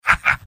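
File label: laugh short, male; soldier; tf2; garrysmod; mvm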